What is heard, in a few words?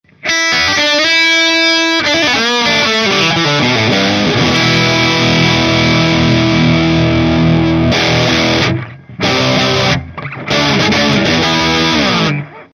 orange thunderverb 200; Amplitube Orange